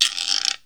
Perc, EMU Proteus

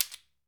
AFRICAN, African Perc

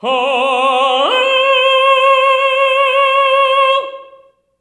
TENOR OCT C4

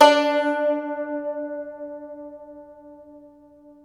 TAR D3

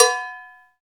PRC cowbell, Roland; CowBells; Club-50 - Foundations; CowBel+Metl

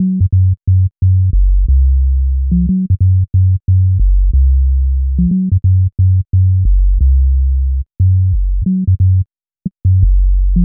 Bass, Hip-Hop

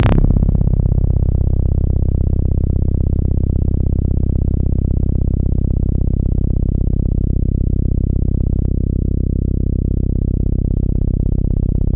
AnalogBass c1, Yamaha YX81Z

